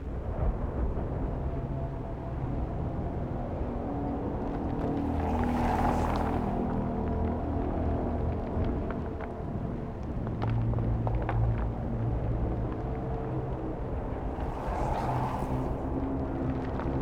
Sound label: amb mountains; kindred mountains; spirit blossom